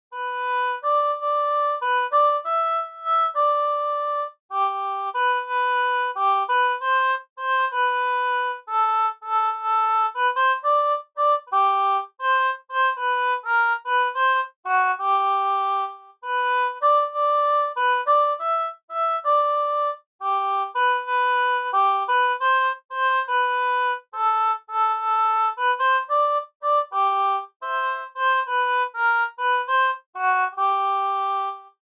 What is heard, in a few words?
Oboe